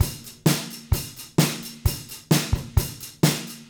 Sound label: Beat Loops; Zero-G; Monster Beats